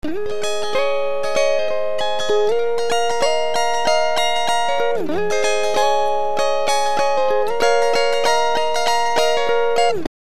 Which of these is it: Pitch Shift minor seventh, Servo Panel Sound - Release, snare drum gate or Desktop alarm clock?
Pitch Shift minor seventh